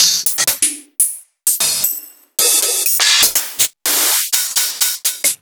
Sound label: Open Hihats